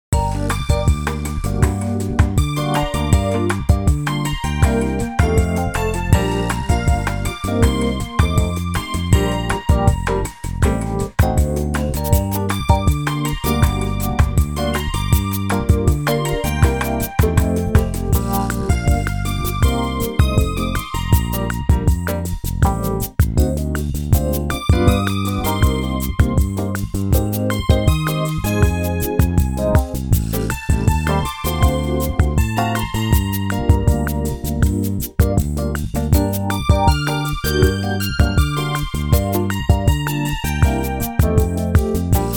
Bosa; Bosa City

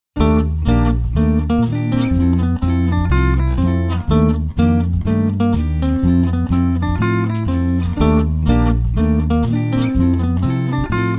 Bright Jazz

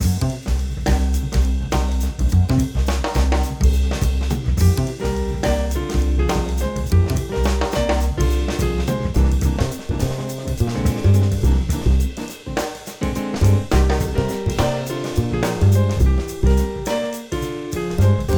Jazz City; Jazzy

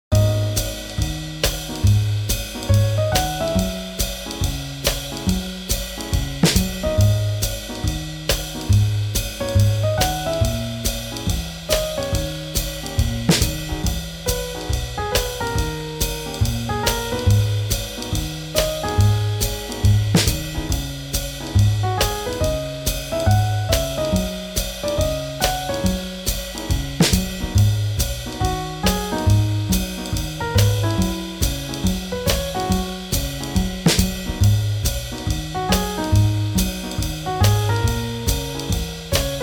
Swing Jazz

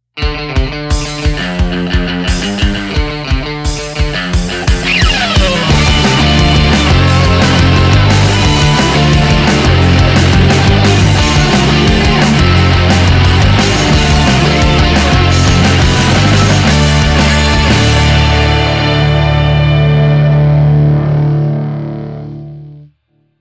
Rock Rock; POP

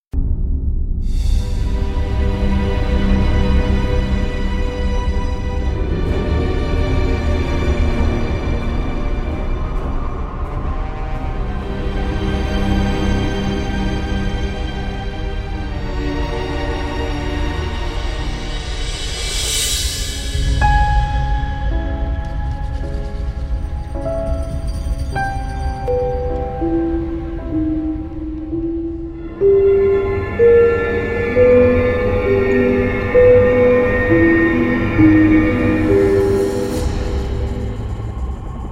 Goblin, Tension 공포

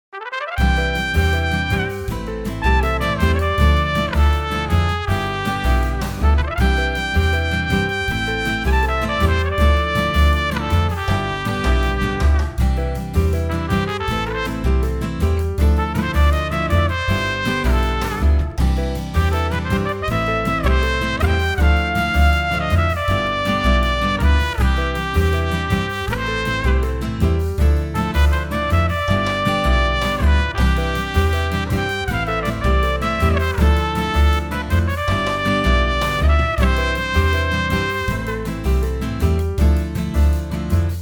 Easy Listening; Easy Listening 2

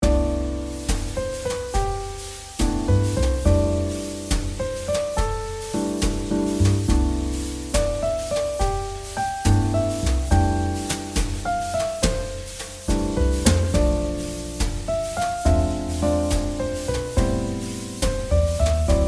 JazzBallad; 잔잔

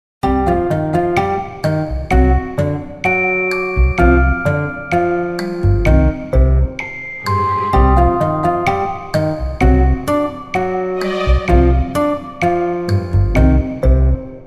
Comic, Comic Slow; Comic 2

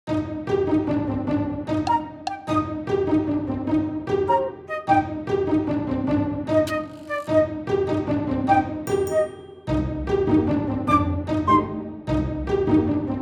Bither

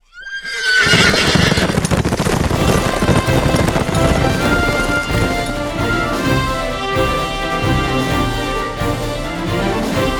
Western Music; Grand 희망적

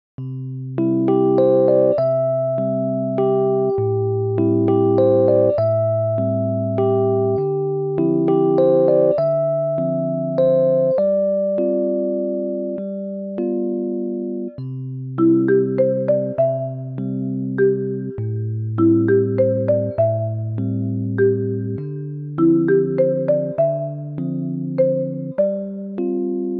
New age; 잔잔

